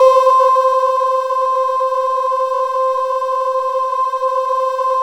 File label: MAT VOX C4, OBI PADS; Zero-G Ambient 1